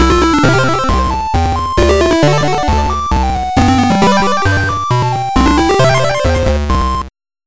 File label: Level Complete; Pocket Music; gb; Riff Slider